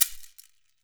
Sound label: GANZA, Brazil Drum Perc; E-MU Producer Series Vol. 7 – Old World Instruments